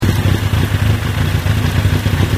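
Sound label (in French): ralenti akra, Yamaha XJR exhaust; akrapovic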